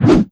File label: wolf swing; wolfteam